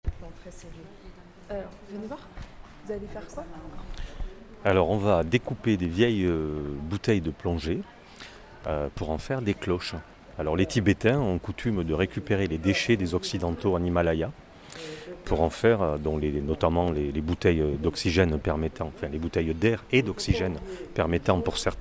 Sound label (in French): KLING CLOCHE TIBETAINE